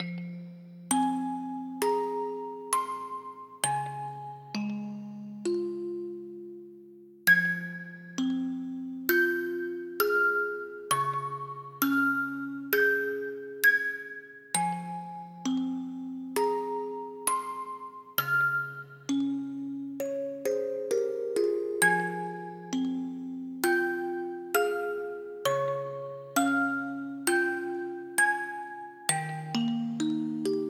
Music Box; fantasian

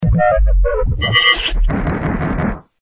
Robot Dead; Granny 3; Android

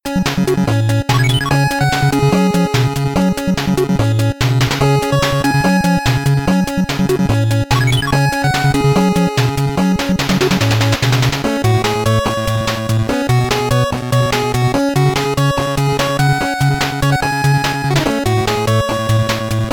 BGM 8bit; Mighty No. 9; 8-bit soundtrack

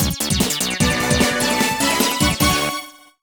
Jingle Mission Completed; bomberman 2; nintendo ds

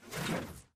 Magnet Platform Shift

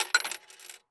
Shop Item Bought; virtual city windows gamerip 2009

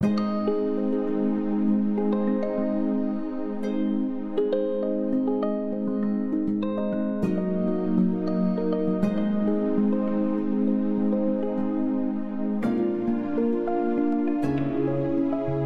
Woodburrow (Conversation)

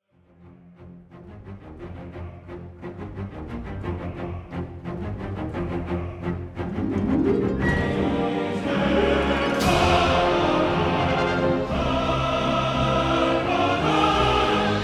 Epic Event Menu Choir Short; gamerip; star wars the old republic rise of the hutt cartels and shadow of revan

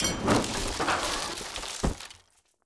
Vent Falling

